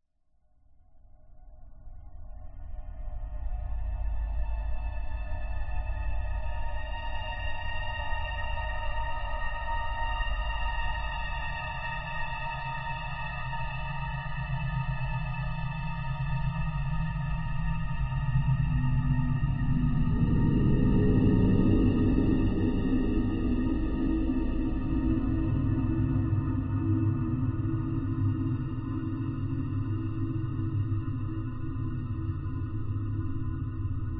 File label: horror atmosphere dark serious sinister discordant; scott lawlor